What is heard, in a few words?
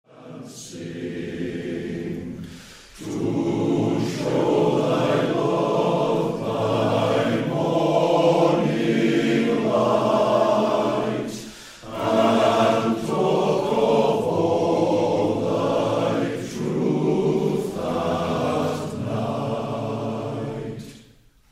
Deep Harmony, male voice